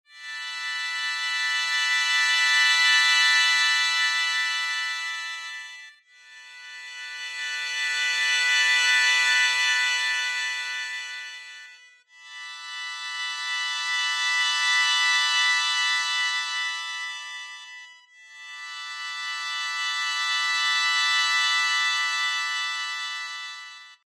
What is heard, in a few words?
Sho